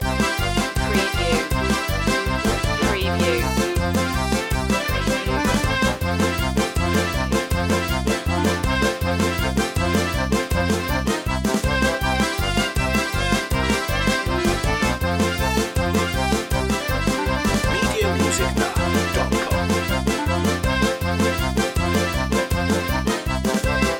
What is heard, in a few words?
chase polka loop